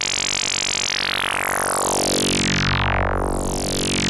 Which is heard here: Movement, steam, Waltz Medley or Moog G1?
Moog G1